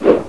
pick miss, weapons; wanted

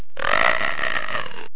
zombie idle

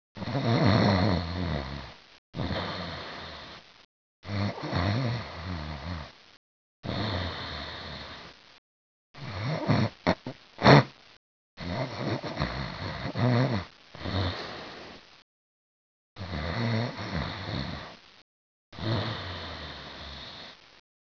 snore

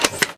open

